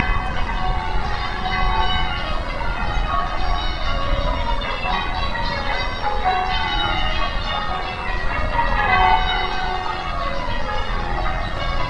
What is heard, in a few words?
bells